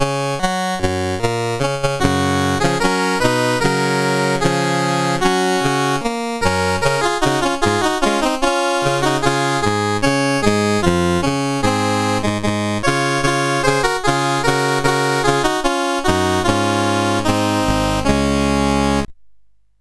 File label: menus, sid CSG8580R5; Games Summer Edition